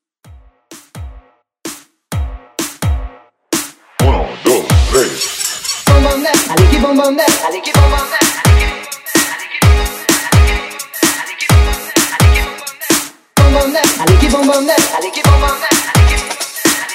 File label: Electro Funk Vocal